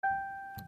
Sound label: plays g note on piano